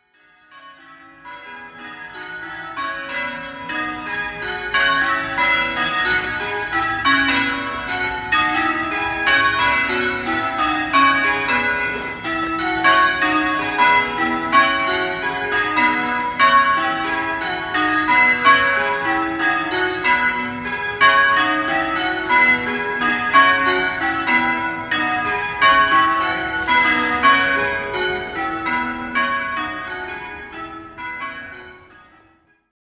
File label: Bells, bellringing; Holy Trinity